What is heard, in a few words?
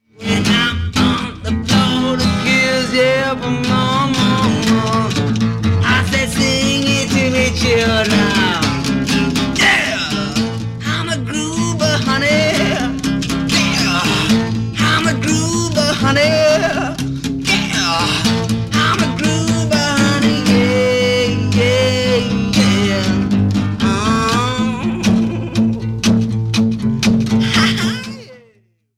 groover solo acoustic